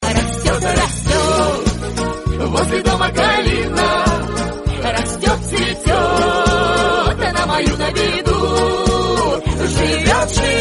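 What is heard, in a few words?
typical russian song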